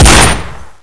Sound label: colt gov fire, weapons; firearms